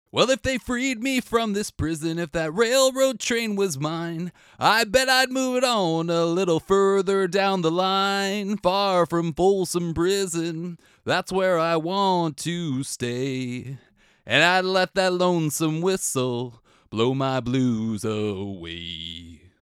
Singing Demo No Air; Scarlett 4i4; focusrite

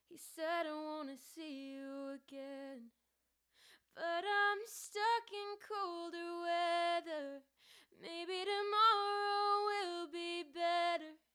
singing microphone sample; Shure MV7X

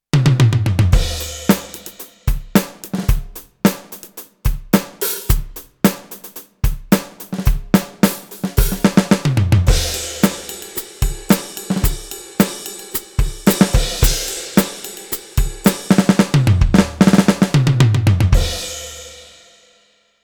Deep Rock kit; alesis nitro ultimate

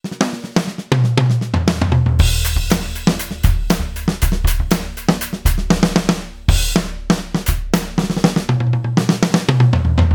Maple Kit Test; alesis strata club

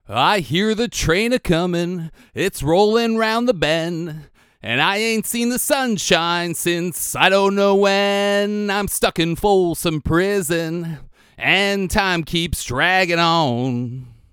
Vocal Sample without AIR Mode; 2i2 Studio; focusrite

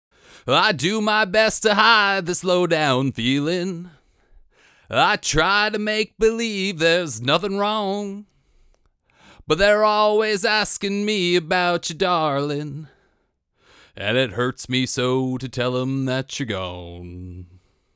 Singing; AKG P420

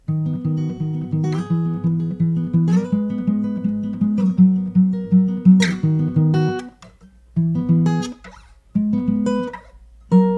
Shure SM57 microphone guitar

